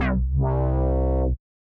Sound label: Mean Bass C1, 135bpm; Zero-G Sound Sense Grime Scene; GS Construction Kits; The Hoodrat; 90 s Sample CDs